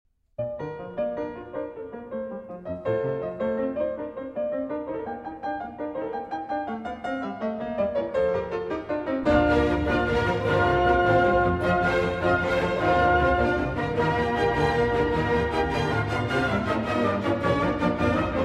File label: Piano Concerto in B-Flat Major, Rondo. Molto Allegro; Beethoven Piano Concerto No. 2 in B-Flat Major, Op. 19